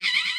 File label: Dinosaur